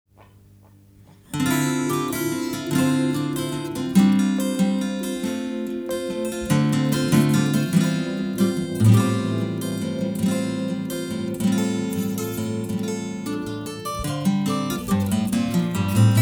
guitar and rhodes mix

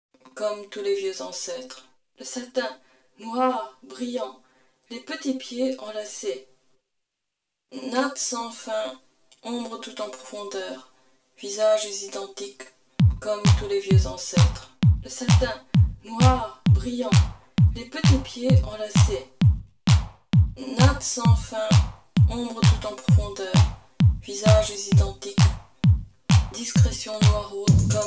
remix

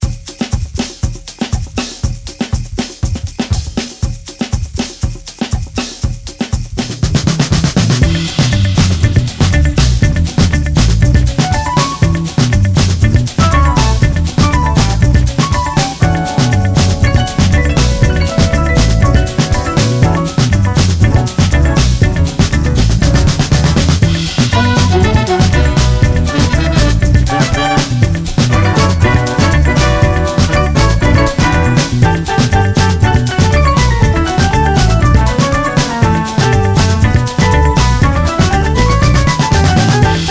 brazilian sleigh bells

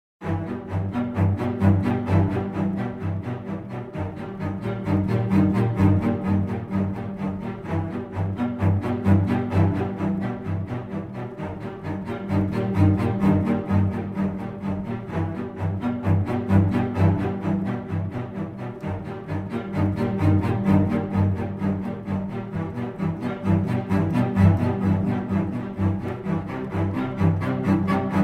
strings and piano

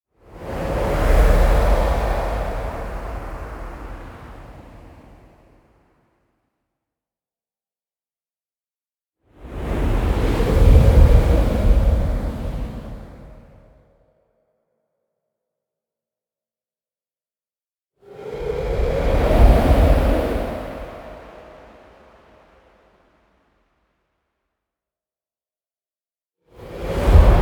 whoosh windgust

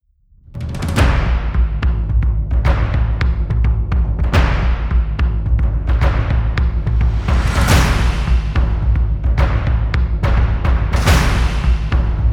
107 bpm drums

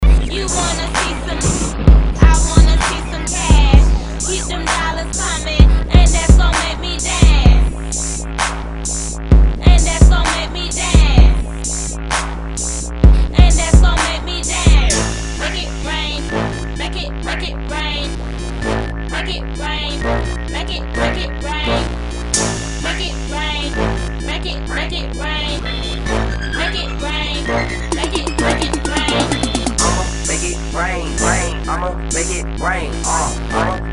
dubstep remix

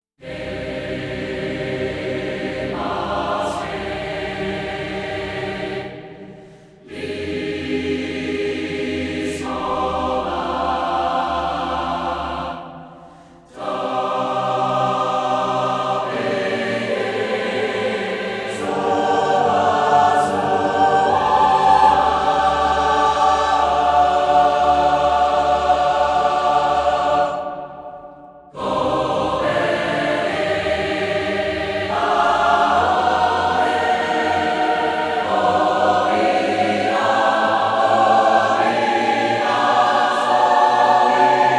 a cappella choir; megatrax

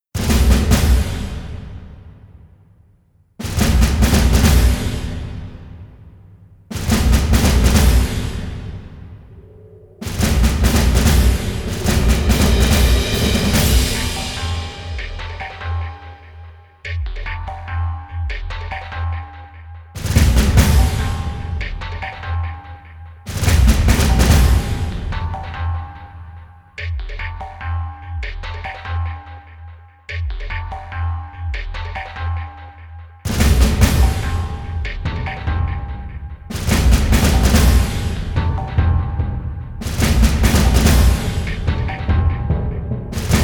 drums

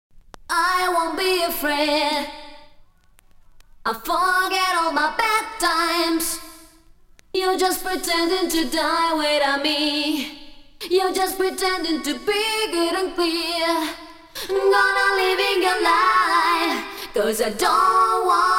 vocals only

